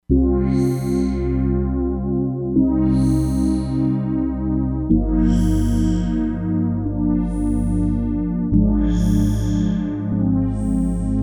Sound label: DIGITAL ATTACK; Crumar Bit 99; Factory Programs